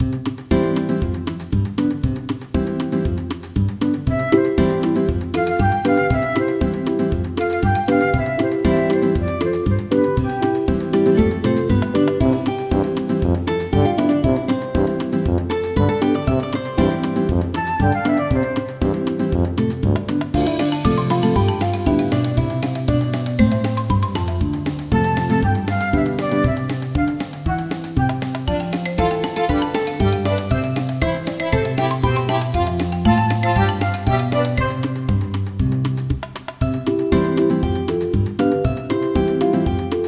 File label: Bgm Game Music